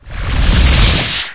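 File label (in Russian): огненная атака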